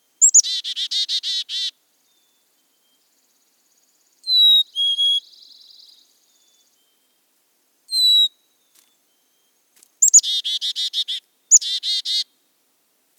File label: Black-capped Chickadee